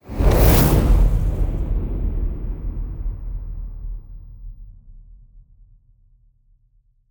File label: LARGE FIRE COMING, ANALOG; WNF ANALOG LIBRARY